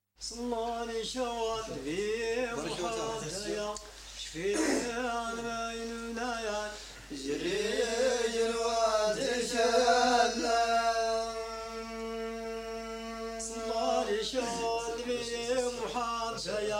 Taqacid; Marokko

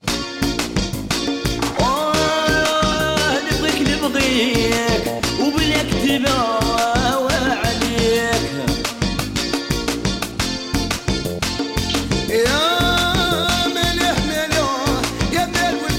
Rai

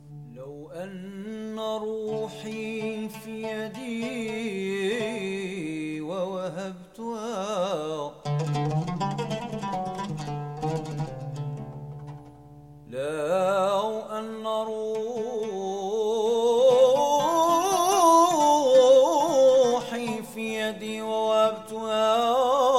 mawwal al hiyaz al kabir; Al-andalus

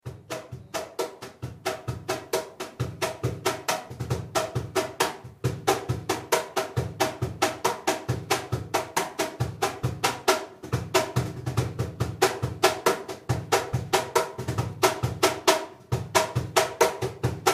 Vals

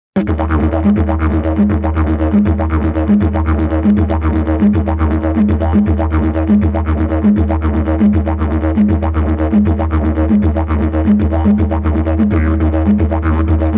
Gallop

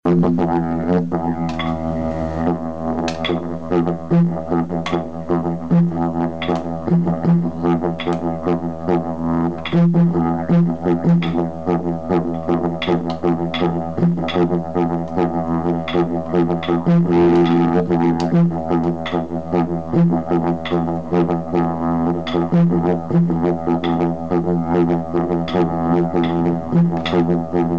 solo

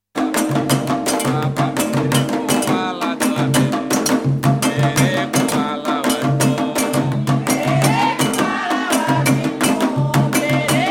Candomble

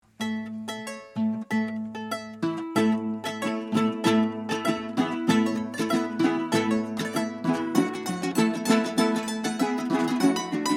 Son huasteco

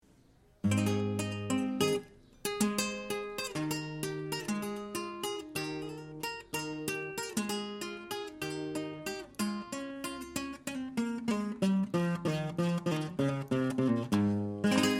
Criolla

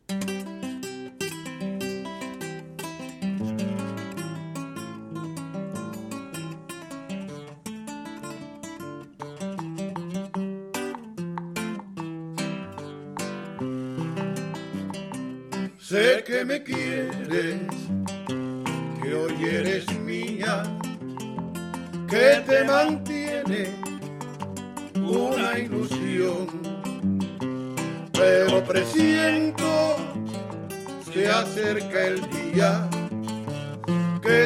Bolero cancion

